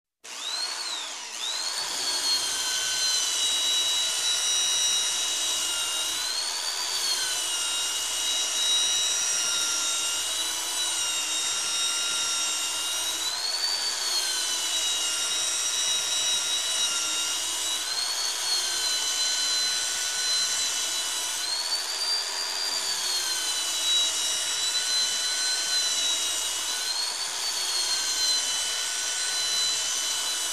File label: Electric Hand Cutter Usage